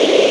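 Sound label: Engine Combo Exterior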